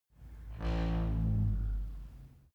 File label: wood door old creak low